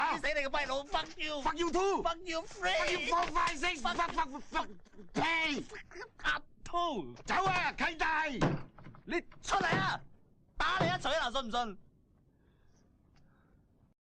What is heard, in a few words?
Ringtone